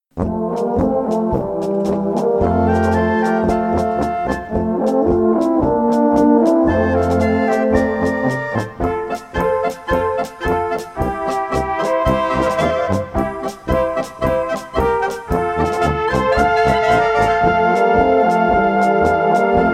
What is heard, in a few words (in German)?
SÜDBÖHMISCHE POLKA; Auf nach Elters